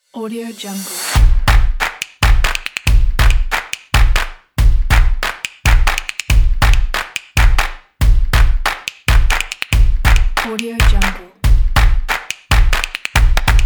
typography action drums claps

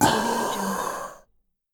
horror ghoul monster death

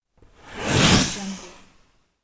fast smooth minimal transition

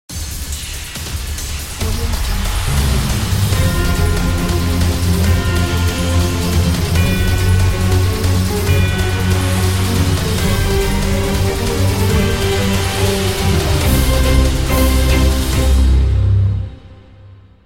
energyzing orchestra intro

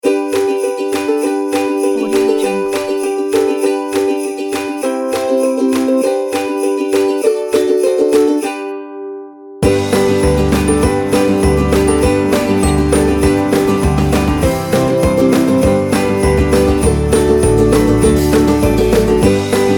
jingle bells ukulele

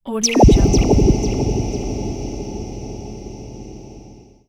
space horror ping

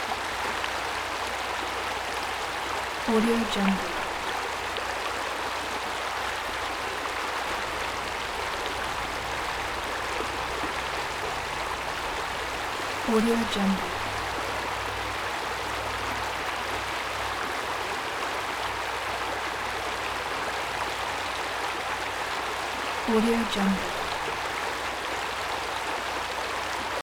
stream bed

